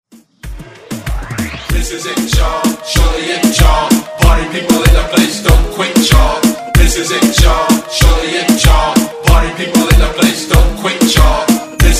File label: Reggaeton Hype Intro